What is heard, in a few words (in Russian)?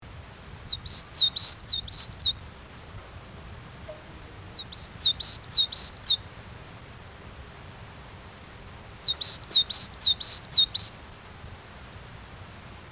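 moskovka golos, coal tit voice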